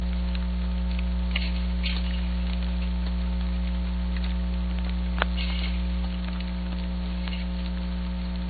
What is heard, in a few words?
mystery hammering